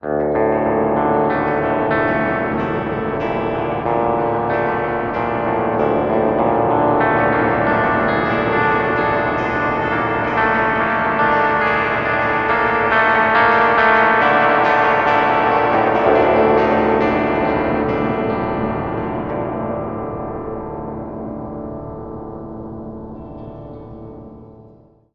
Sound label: G steel